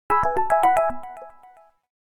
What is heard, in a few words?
A toy watch; Samsung Galaxy S GT-i9088; alarms